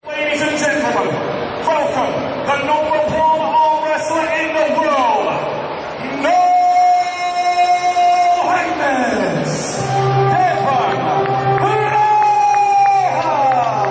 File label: Is Calling RNB